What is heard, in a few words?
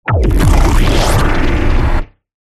DESIGNED The End; PMSFX